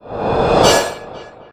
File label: spell Done Buff; Nevergrind